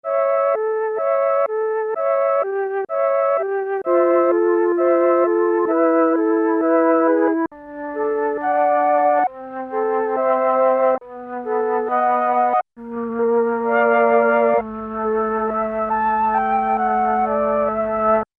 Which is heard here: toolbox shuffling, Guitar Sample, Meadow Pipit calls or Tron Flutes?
Tron Flutes